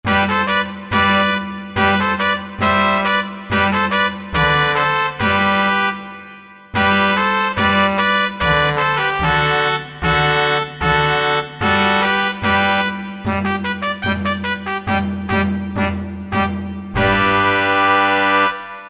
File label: Baroque Brass, Kurzweil K2000; Kurzweil K2000 Value Pack; Extra Programs; BRASS1X